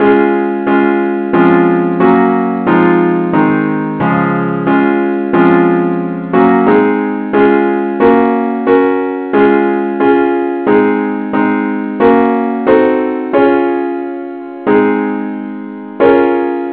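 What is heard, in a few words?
advance accompaniment key D flat; Christe Sanctorum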